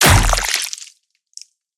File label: DESIGNED CINEMATIC CHOPS, BLOODBATH; PMSFX